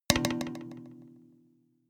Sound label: notification kalimba delay body hit